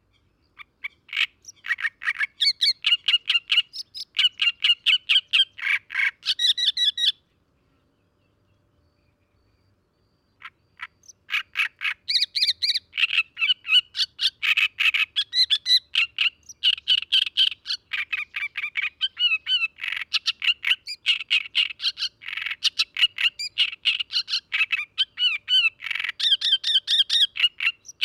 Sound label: Long songs of a presumably unpaired male